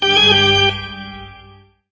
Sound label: Haunted